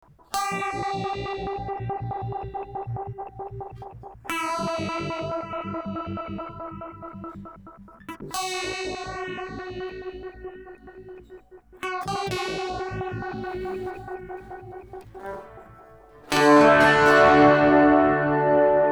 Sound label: delay guitar